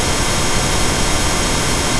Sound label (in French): bruit rose